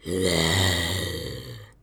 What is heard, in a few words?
zombie moan, Zombie Voice Pack; Tybug Studios